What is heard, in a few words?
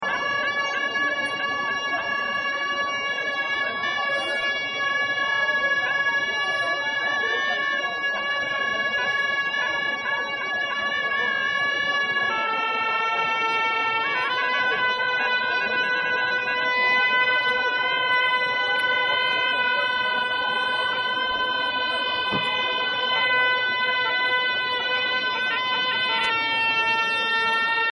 Losar Monks Horns